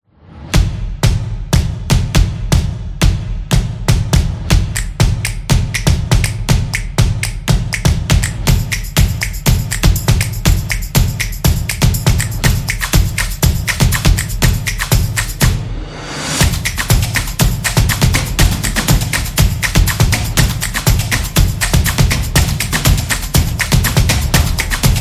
A Drum Upbeat; casual game

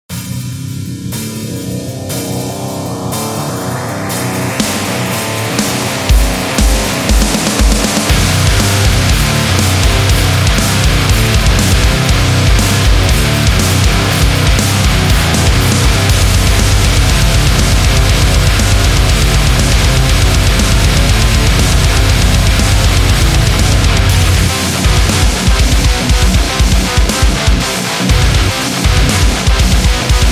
Metal Game

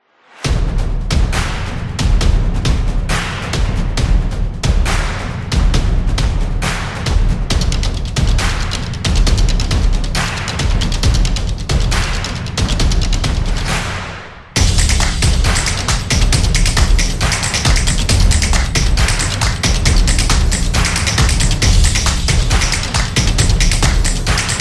Typography Opener; high energy

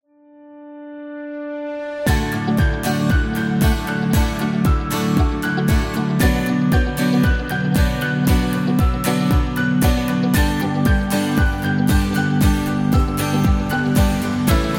Happy Energetic, corporate music; Event; corporate music vol 18

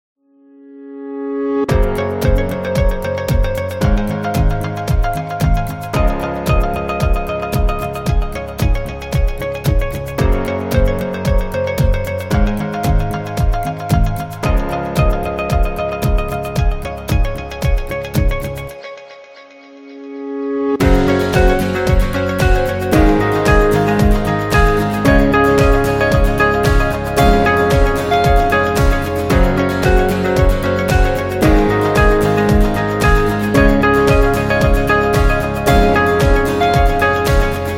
Lite Guitars; corporate music vol 1

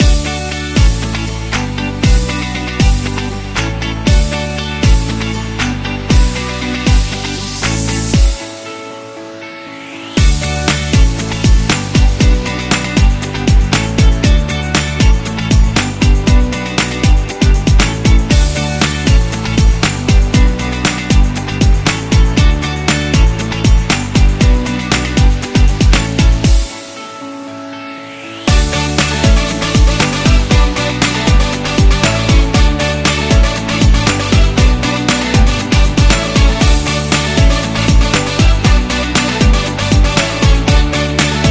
Guitar Indie Pop

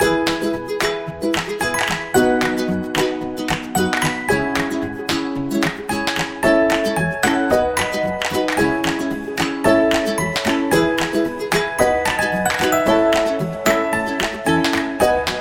This Is Cute, happy music; happy music vol 10

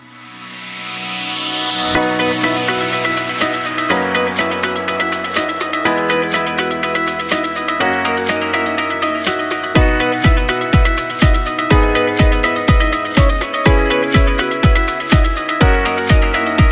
Uplifting Promo; eco-friendly

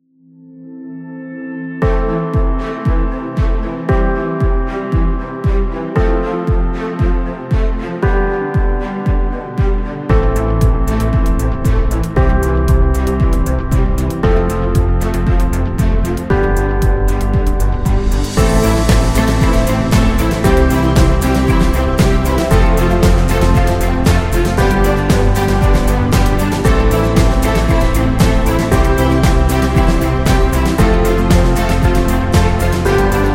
Motive Corporate; waiting music

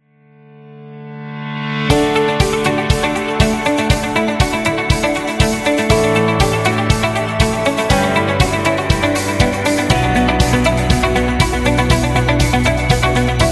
Rock Corporate; eco-friendly

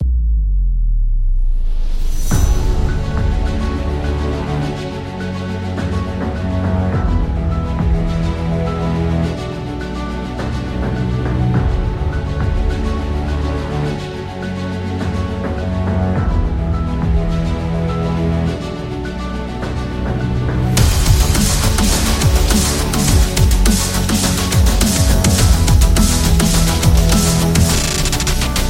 Dynamic Epic; planetarium

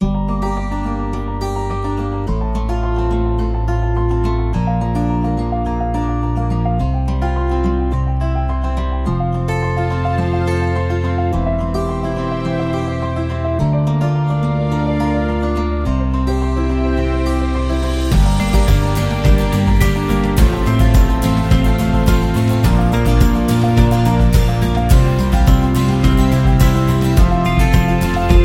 Acoustic Folk

